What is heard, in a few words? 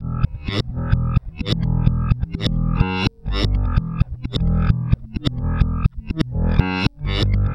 Backwards, Bass, Effected; Bass X